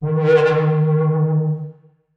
Forbidden Worble D#2; radiosonics

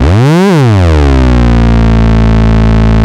MEMORYMOOG

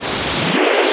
Noise Hit

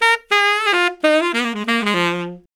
SAX LICK, BRASS LICKS; Ueberschall; Jam Box

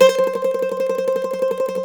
MANDOLIN

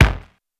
SPEED KICK, drums; Basement drums vol 2

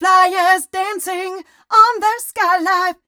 f mel e flat, fem Vocals

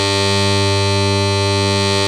DIGI BASS

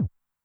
BD High Tune Short Decay, 909mod, BASS DRUM; classic machines

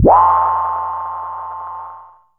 WOARRR, MACHINE PERC; Zero-G Ambient 1

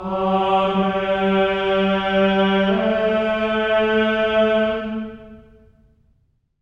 amen; Spectrasonics; Symphony of Voices; GR AMENS

